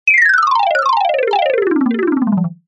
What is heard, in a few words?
cartoon descend fast mallets; zapsplat